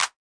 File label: Clap; STYLE KITS (Hip Hop, Etc)